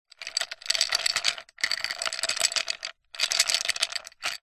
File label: ice cubes several thick drinking glass no liquid movement